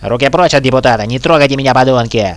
startle, hostage reactions

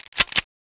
aug forearm, weapons

